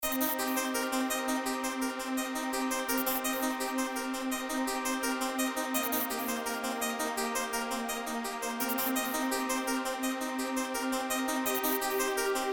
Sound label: dnb remix; mentalfloss; recursion13